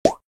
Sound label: pop